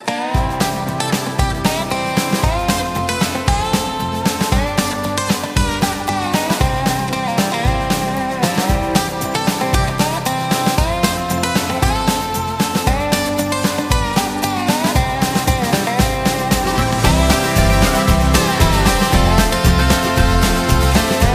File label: Country